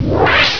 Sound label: beamer; 3DGameStudio; Adeptus